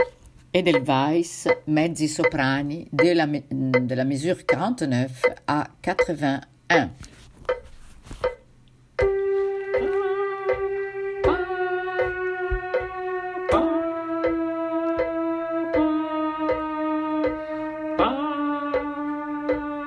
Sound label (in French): mezzi